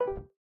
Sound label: Exit Terminal; Lethal Company